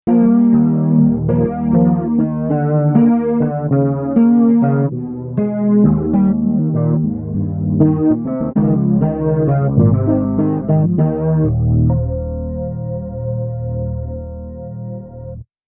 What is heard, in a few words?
Fretless, Bass; PC3